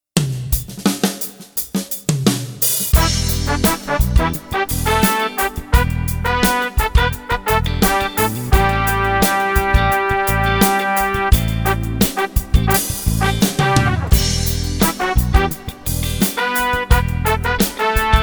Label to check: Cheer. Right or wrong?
Wrong